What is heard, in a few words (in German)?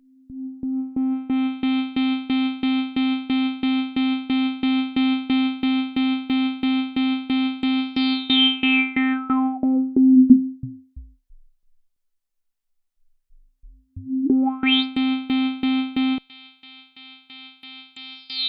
Filter Resonanz laut